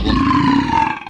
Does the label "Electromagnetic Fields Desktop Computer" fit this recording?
No